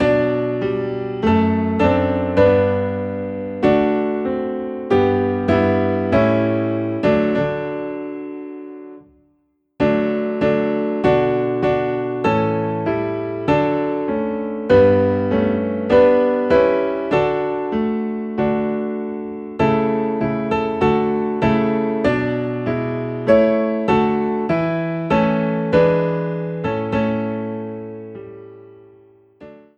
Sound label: Piano in D